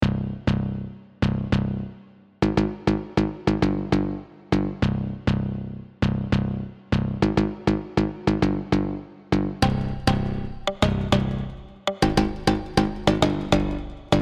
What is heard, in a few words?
Bounce 200 BPM C minor